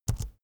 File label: keyboard laptop enter press fast soft; kommunikation; computer